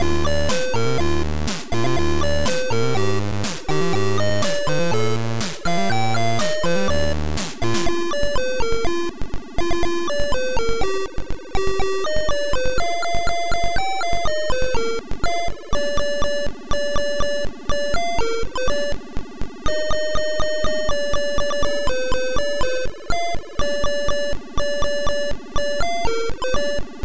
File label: JINGLE